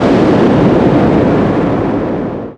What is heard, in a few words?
NOISE, Production Effects